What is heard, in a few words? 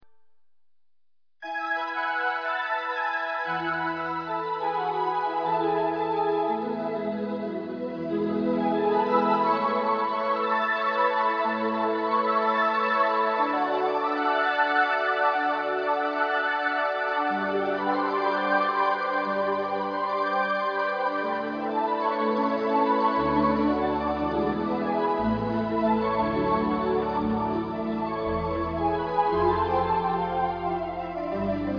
harp